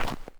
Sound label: snow step; Golden Crayon Sounds